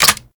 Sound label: Reload Dual Pistols; Warframe; Grineer; Queen Guard